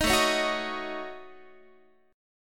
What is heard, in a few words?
A 6th Flat 5th A Guitar, chord; A6b5 strummed; 18,17,16,16,19,x; 4A,4E,5A,5D,5G#,6C#